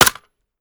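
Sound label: fire last player mech, weapons; gmod; viper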